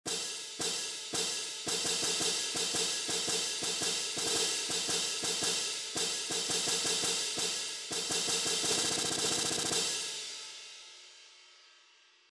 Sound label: Cymbals